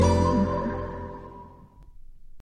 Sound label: psp boot sound; games; ringtones